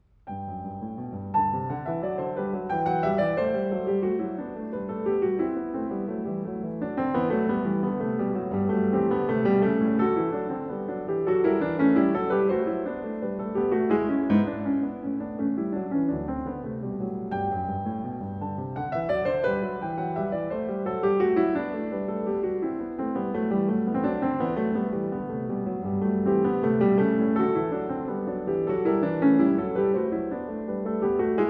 Goldberg Variations Var. 6. Canone alla Seconda a 1 Clav; Bach; BWV 988; Live at Carnegie Hall, New York, 2025